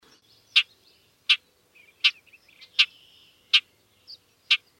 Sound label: Bobolink Male Chek